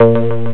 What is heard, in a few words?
Change Option; Oh No! More Lemmings Demo